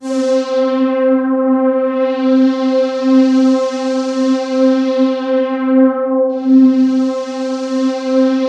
C3, SynthLine

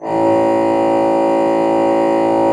A1, Cathedral Organ, HOLZPRINZ.; Propeller Island

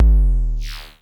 EBASS FX, Dual Percussion Synthesiser; Klone

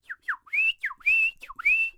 WHISTL; Spectrasonics Vocal Planet; Jazz FX

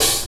Hihat; Sonic Foundry (Sony Creative Software); Stylus Pressure Urban Grooves on Digital Wax; Lenny Hendrix

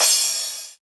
Crash TR-909 Unprocessed; POMMESFRITES

